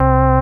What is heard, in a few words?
DM ORG1, Dance Mega Synth